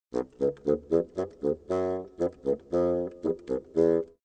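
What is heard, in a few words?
bassoon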